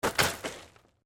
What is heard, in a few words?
Bicycle Mountain Bike Land Slide Gravel, Bike being thrown around to simulate crash impacts